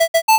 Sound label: alert; agentmag